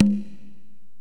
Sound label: Korg x5, Drums